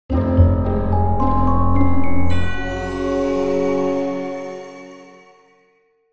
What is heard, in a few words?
puzzle solve; The Da Vinci Code; XBOX